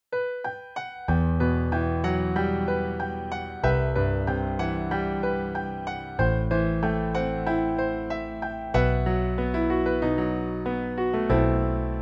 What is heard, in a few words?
Piano Bass MIDI